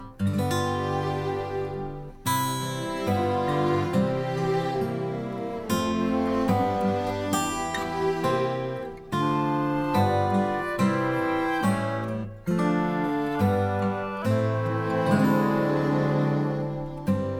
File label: Callback Backing; frozen callback materials